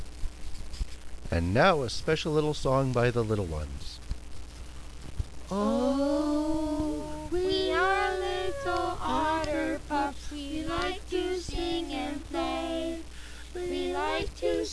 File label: pups